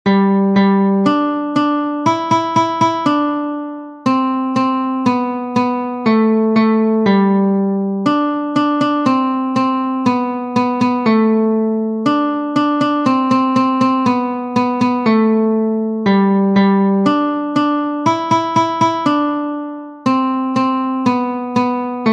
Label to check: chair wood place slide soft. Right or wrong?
Wrong